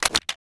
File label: reload open, foley; rpd